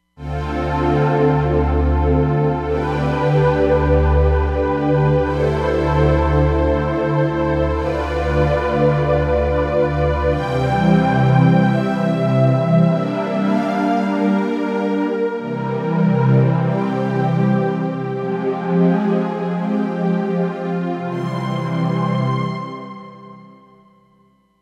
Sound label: Stringship, Roland Fantom XR